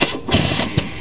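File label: BIKE START; TRANSPOR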